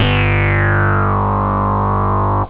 alien, synth

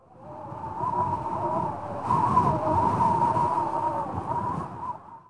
WIND, wind

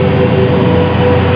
ambi; SSTEEL

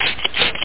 drop

